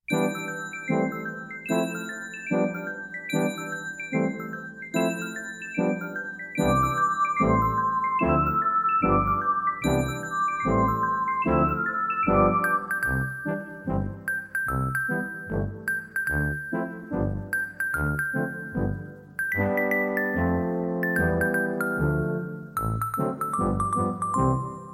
Ringtone

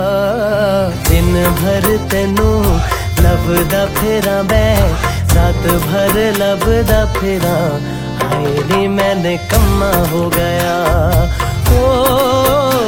Romantic Love Song Ringtone

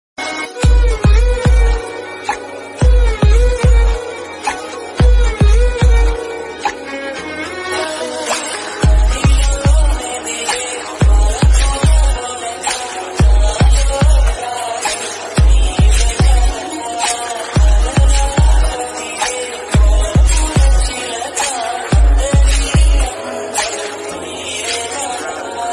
Tamil Movie Ringtone; ringtones

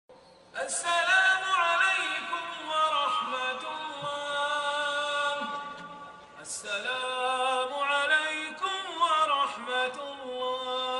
Islamic Salaam Ringtone

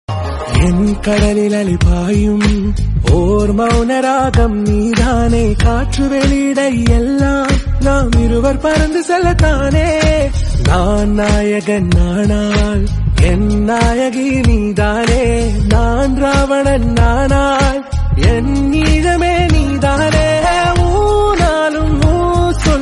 Romantic Telugu Song Ringtone

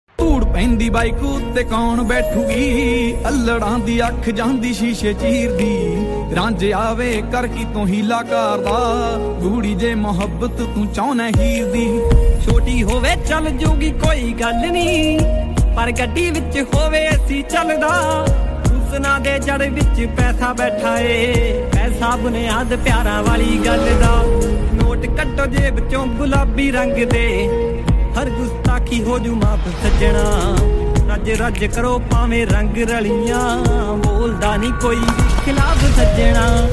Song Ringtone; ringtones

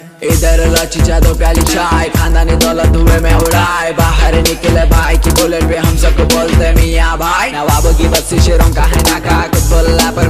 Heydrabadi Song Ringtone

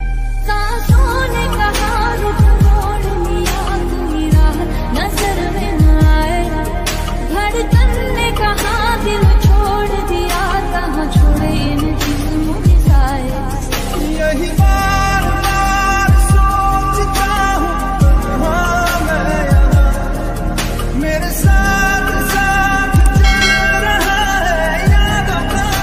Breakup Song

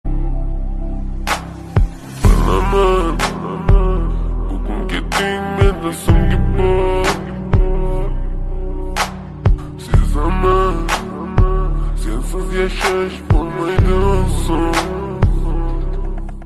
Heart Broken Old Music Ringtone

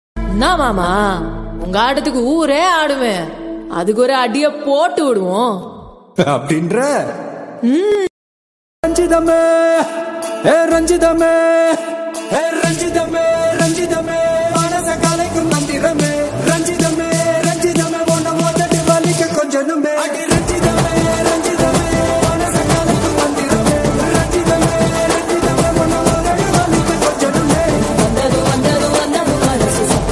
Tamil Ringtone; Varisu

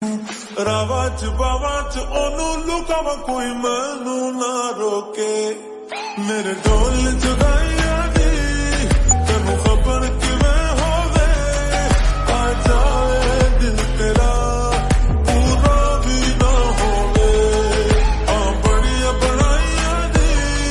Lofi Ringtone

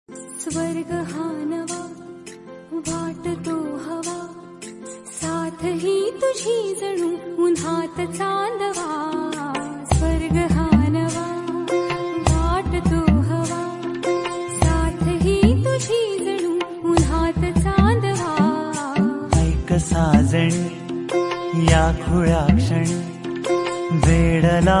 Marathi Ringtone

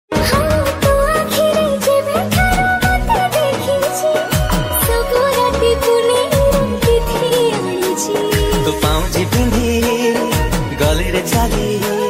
Odia Ringtone

